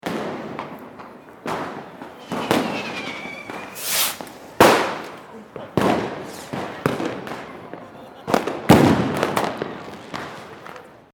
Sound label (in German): Silvester Atmosphaere